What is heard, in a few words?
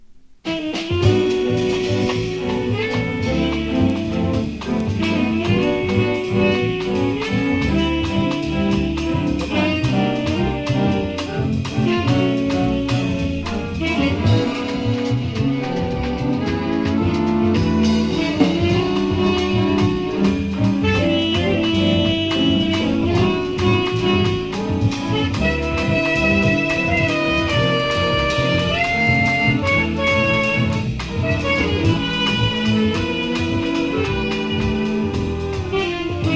bass violin choir; New York